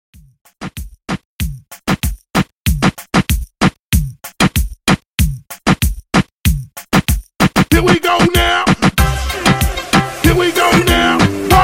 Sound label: Hype Reggaeton 95 Bpm